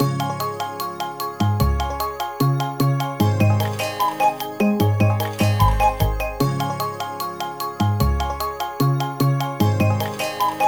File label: Clock Song; Strawberry Shortcake The Four Season Cake